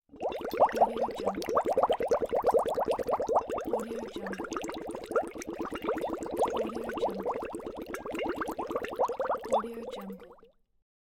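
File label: laboratory ambience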